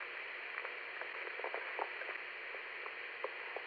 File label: radio loop